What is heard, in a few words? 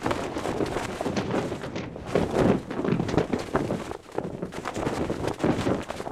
flag